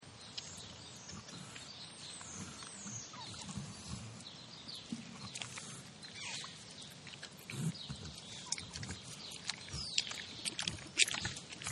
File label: Pig In Mud Loop; Pig Eating Wchickens; Pigs; Animals